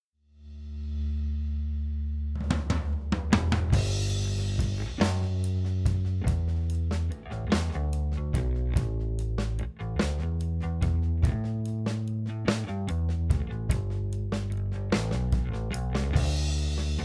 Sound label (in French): drums bass avec